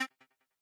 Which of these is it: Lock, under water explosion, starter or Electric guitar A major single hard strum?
Lock